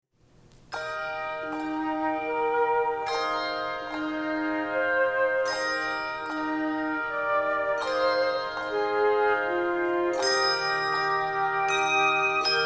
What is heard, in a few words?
Handbells; Chorister's Guild